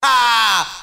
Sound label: "ah", Voice